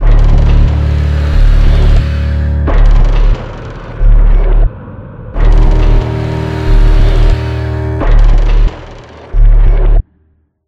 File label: Combat Pre End Loop